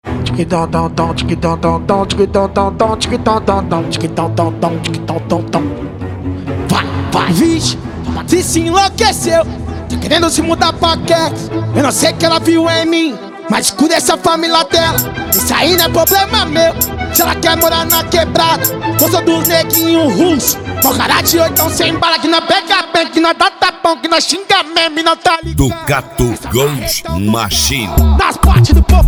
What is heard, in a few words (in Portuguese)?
especial funk